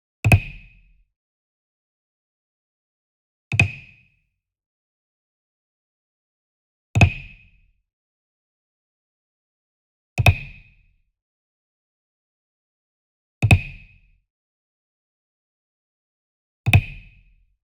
Wood Percussion Wood Block Japanese Resonant Flam Near; boom library; Cinematic Strikes Construction Kit